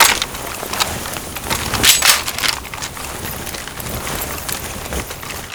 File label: weapon toss catcher; Call of Duty Modern Warfare